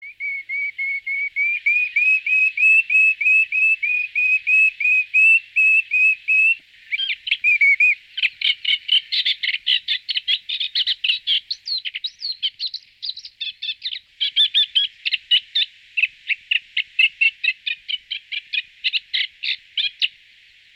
Carricerin real, pajaros

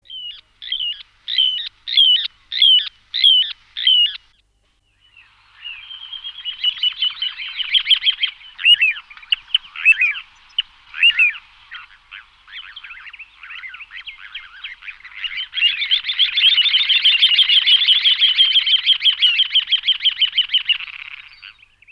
Chorlitejo chico, pajaros

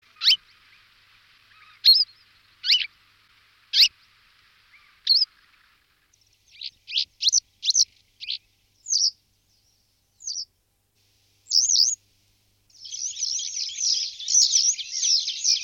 Lavandera boyera

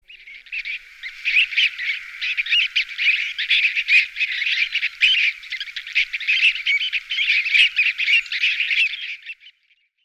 Correlimos comun

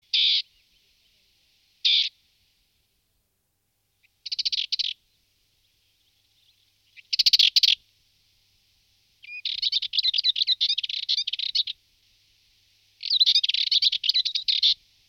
Curruca rabilarga, pajaros

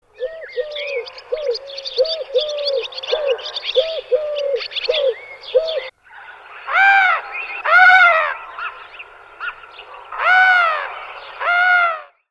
Tortola turca, pajaros

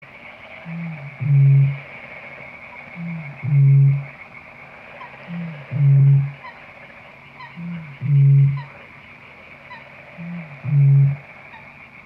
Avetoro

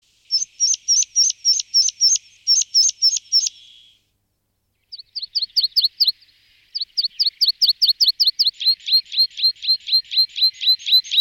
Bisbita alpino, pajaros

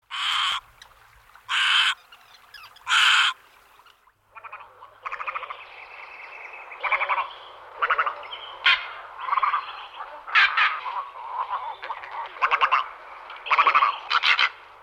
Garceta comun, pajaros

